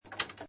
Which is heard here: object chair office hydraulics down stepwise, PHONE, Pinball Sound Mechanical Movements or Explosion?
Pinball Sound Mechanical Movements